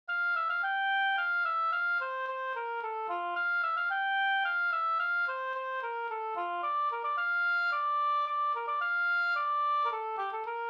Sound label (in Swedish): slangpolska